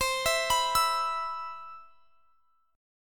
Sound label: C Major 7th, chord; CM7 arpeggiated